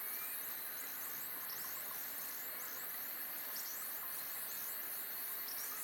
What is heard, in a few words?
ambience day; serengeti kings